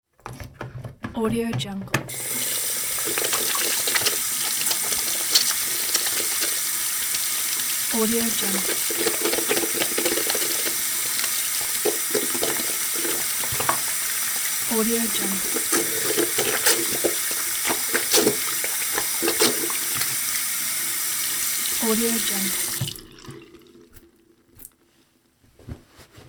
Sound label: washing hands sequence